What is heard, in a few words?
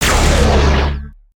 Spider Boss Fire Shot; creatures mechanical